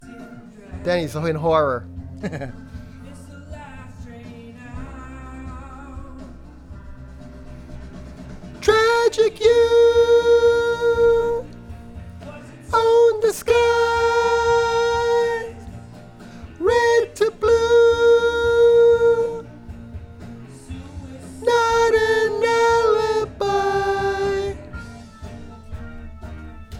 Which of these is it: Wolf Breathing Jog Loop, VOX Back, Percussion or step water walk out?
VOX Back